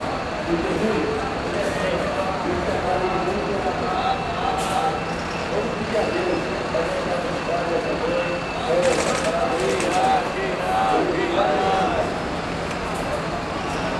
Ambiencia rodoviaria do plano piloto varios onibus, carro de som; onibus dando re

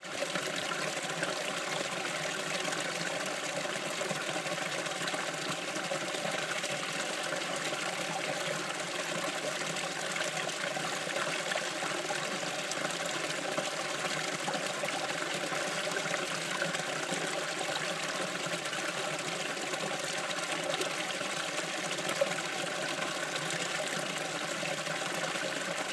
Pequena Nascente com queda dagua captada bem proxima

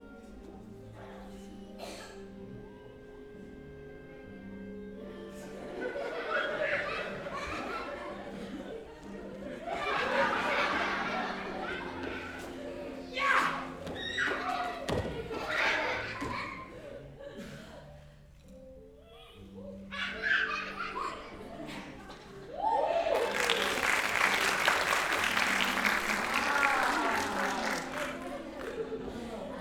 Apresentacao palhaco com musica, criancas, risadas que crescem em aplausos; multidao

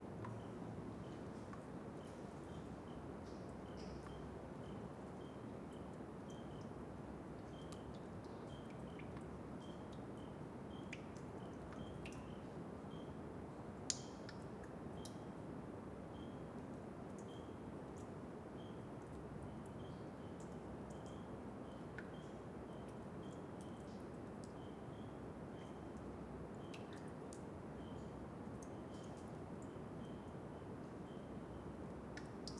Gotejamento dentro da caverna angelica com grilo e rio longe; agua

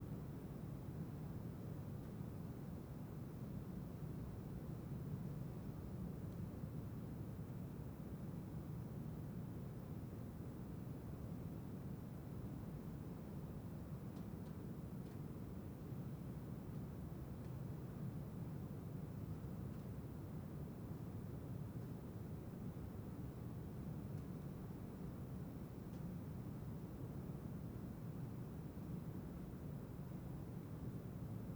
Caverna angelica salao silencioso gravacao em ab alguns estalos, rio longe; grave; ambientes naturais

